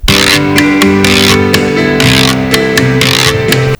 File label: guiro